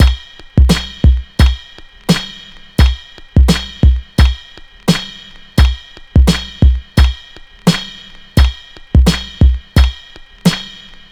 86 bpm drum beat c key

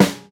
short snare one shot f sharp key

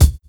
rich high end kick drum sample d key

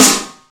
steel snare drum sound b key

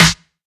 steel snare drum sound e key

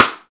dry snare sample f key; snare drum samples

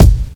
silky kick one shot a sharp key; kick drum samples

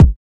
nice kick drum single hit b key

